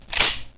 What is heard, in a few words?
tau release